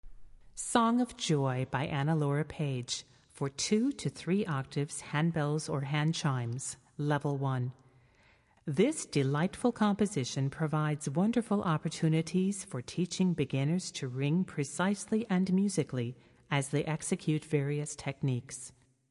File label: Handbell Music; Publisher: Jeffers Handbell Supply